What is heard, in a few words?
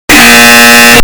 buzzer not loud